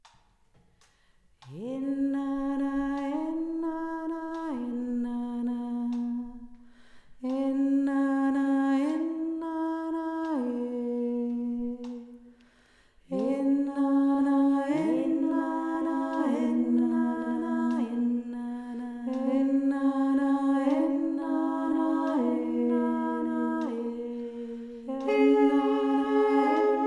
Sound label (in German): e nana e nana ena, Gesang; die vier Stimmen